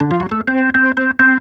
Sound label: Organ Lick; Ambient Grooves; Organs Licks